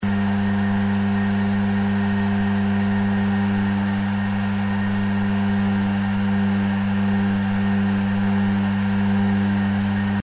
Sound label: hoover